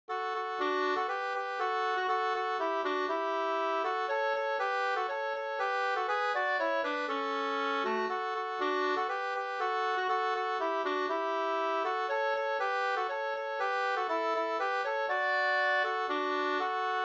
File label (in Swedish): folkdans, Tjeckisk polka Ddur; Sternpolka